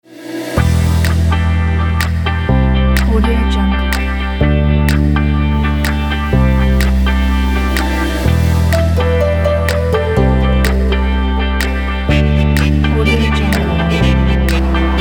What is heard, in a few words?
upbeat energetic modern pop